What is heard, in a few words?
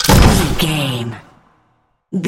sci-fi weapon rifle large shot